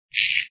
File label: stretch